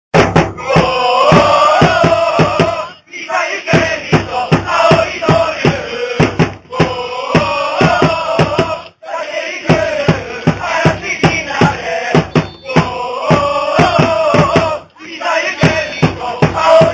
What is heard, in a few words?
shout